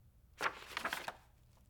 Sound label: menu page turn; Poetry Project